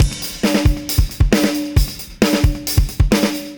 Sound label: fx, Beat Loops, 134; Zero-G; Monster Beats